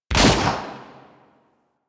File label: Redux Fire Shot, Weapons; Lato Vandal